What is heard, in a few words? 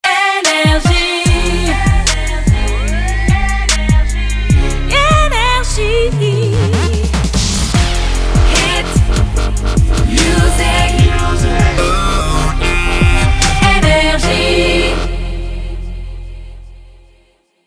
jingle; NRJ